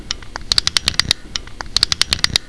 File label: wind